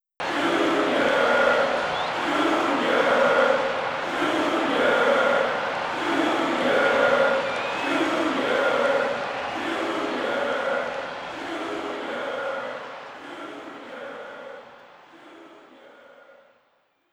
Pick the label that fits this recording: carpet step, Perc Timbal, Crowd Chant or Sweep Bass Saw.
Crowd Chant